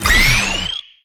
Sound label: Enemy Active Charge Common Full